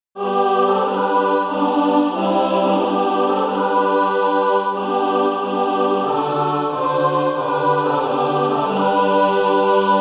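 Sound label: mixed, SATB Practise Music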